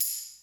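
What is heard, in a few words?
percussion, Drum & Bass; Ueberschall; Drum & Bass Resonance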